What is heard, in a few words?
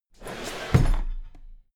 drawer cabinet equipment close heavy